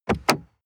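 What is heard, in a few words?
sports car interior glove compartment open short; verkehr-transport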